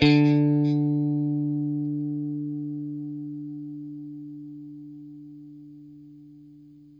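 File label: Stratocaster, Guitar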